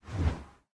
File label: axe swish; smite; chaac